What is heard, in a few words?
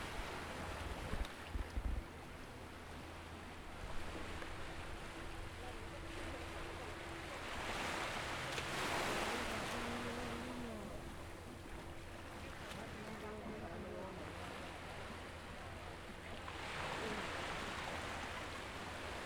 The Sea; Sea Recording; a beach in Jalisco